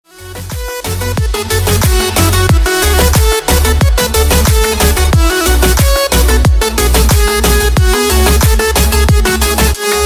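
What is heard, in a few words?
Caucasian interlude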